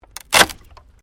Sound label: WOOD PLANK SPLIT